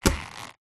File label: foley crutches on wood floor with handle squeak single